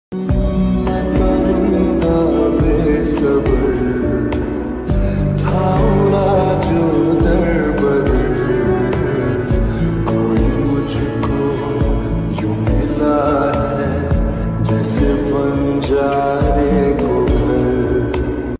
sad song

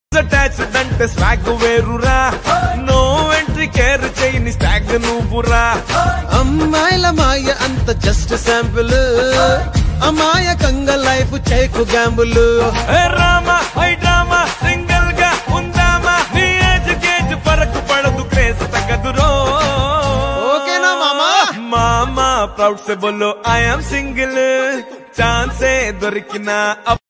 telugu ringtone; mad 2023

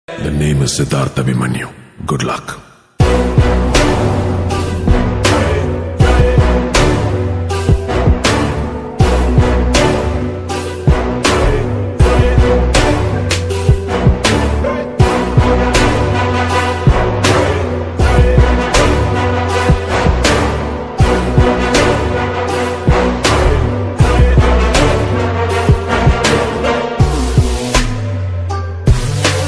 villain bgm; dhruva; for bad boys

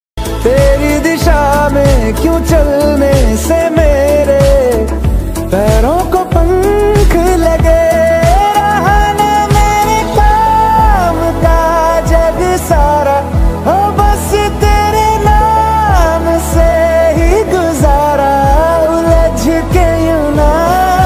movie song ringtone; bhediya; varun dhawan

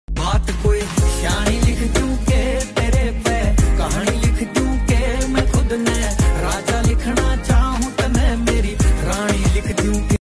ringtone

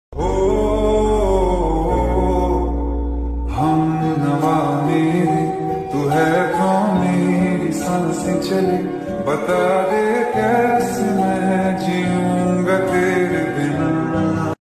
lofi love song, emotion bgm love music

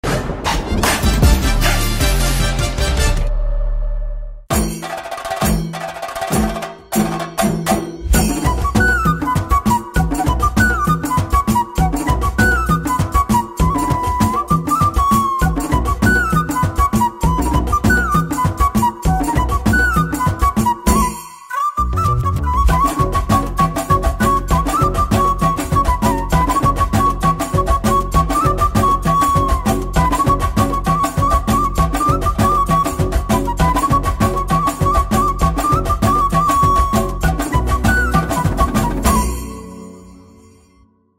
bgm flute chenda cover

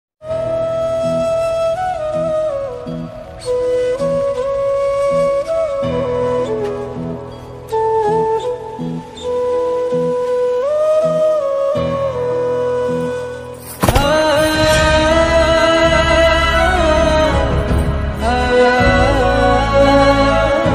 song instrumental ringtone; dabangg

